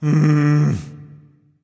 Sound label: sigh; cupid